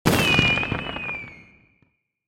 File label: Clover Collected; goldenClover